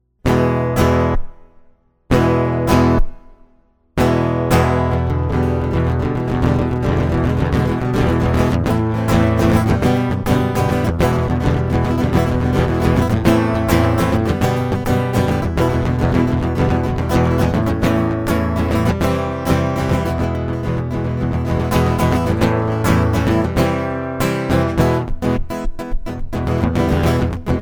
Rolling Boil acoustic

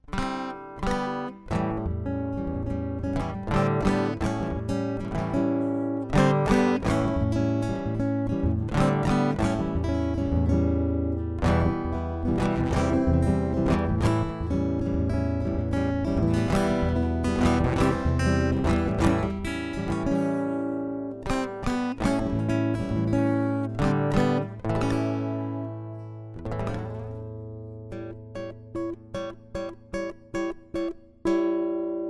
acoustic

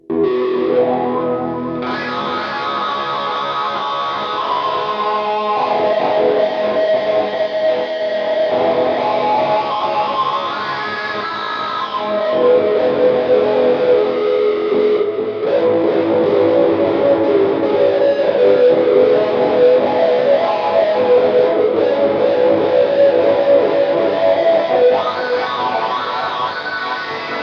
electric

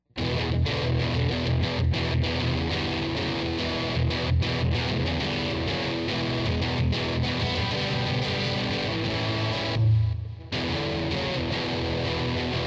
electric; Soul Throwing

